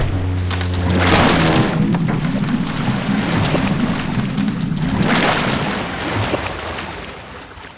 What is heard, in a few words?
ship destruct; Sid Meiers Pirates; XBOX